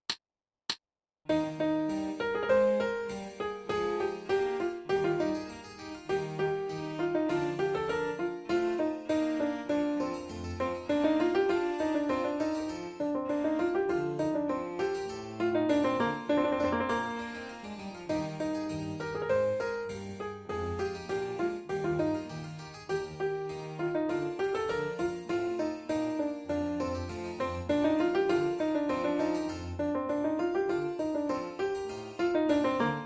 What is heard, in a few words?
Allegro Full Tempo with Solo, Alto Sax; Frederick the Great - Adagio and Allegro